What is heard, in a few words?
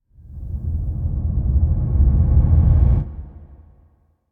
Drone Low Deep Oncoming; Hollywood Edge; sound designer's toolkit v2